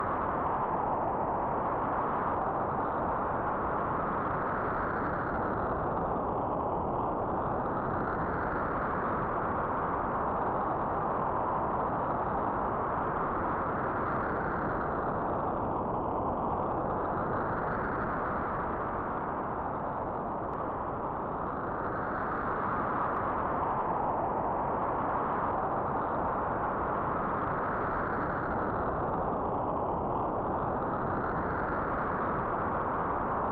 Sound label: Storm; the punchuin